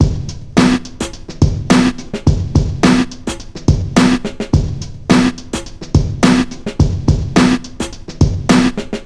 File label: Hiphop Mpc500